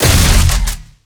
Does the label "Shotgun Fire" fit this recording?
Yes